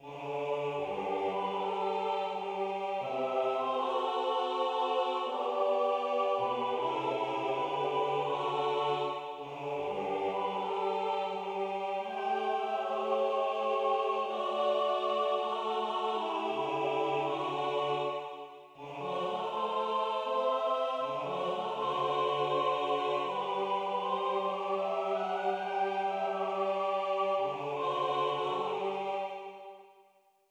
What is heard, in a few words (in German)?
Fruehlingsgruss Bass